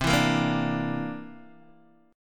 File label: C 9th C down Guitar DADGAD